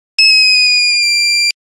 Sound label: Nokia Buzzer Rocket; Windows Phone apps